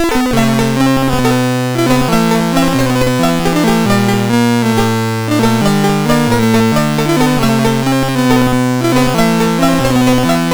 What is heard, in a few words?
Randomly generated music BASIC, sid MOS6581R2